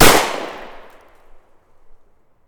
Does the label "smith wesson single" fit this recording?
Yes